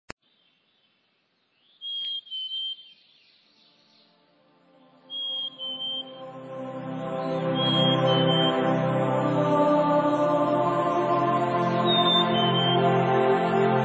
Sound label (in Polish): muzyczny mix relaksacyjny